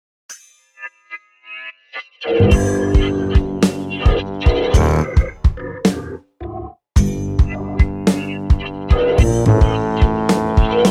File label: Sick And Lonely Drunken Jazz Band